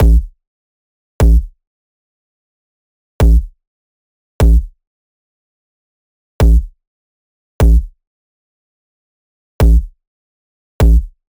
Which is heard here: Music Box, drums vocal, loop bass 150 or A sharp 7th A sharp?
loop bass 150